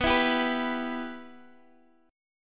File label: B Suspended 4th Sharp 5th, Guitar, Open G